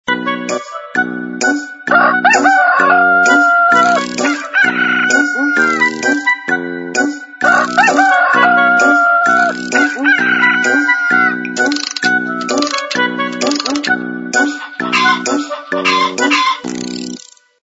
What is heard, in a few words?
Crow; Coolpad S60